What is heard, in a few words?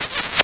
kiss